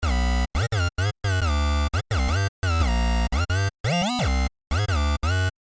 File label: Bassline; D n B